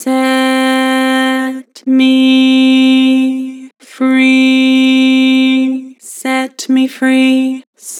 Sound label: Vocals Flat Set me free; Harmor